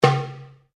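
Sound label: Drum Darabuka Slap mezzo forte, Egyptian Darabuka Drum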